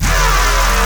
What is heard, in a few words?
bass purge